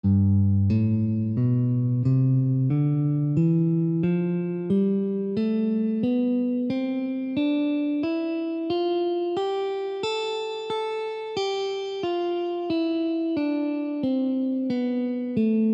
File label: C major A minor 90bpm